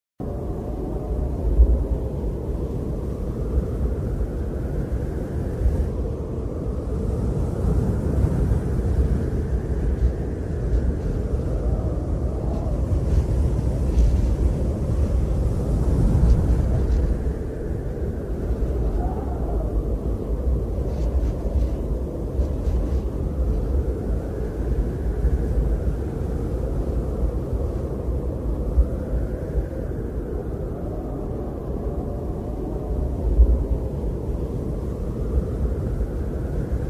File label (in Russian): metel purga i viuga